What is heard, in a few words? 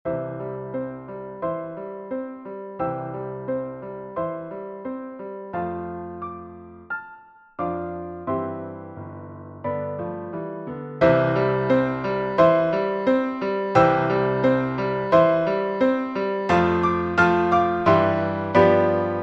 duettino for piano; vanhal johann baptist